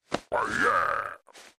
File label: blue Win Elbow; wild worlds